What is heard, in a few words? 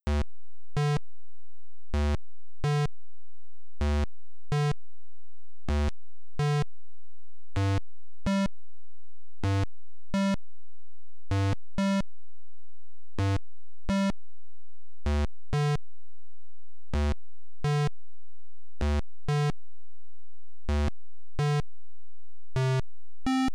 IBM PC-Speaker; Dinopark Tycoon